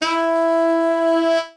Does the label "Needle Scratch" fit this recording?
No